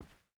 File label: footstep boots cloth walk; Rust